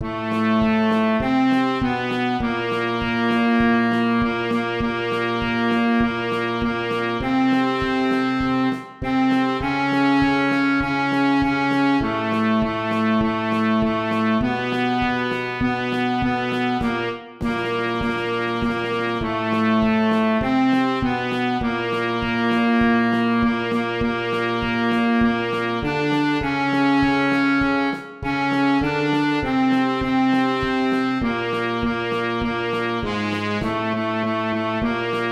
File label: grove trp2 klar2 sax